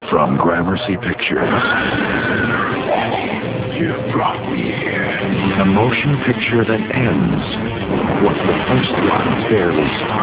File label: scream